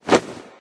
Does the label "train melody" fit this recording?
No